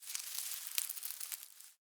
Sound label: Forest Open Glade Grass Move Close, Day; Ambience